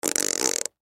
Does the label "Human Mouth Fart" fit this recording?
Yes